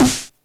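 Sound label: 909 snare; Dr 202; Percussion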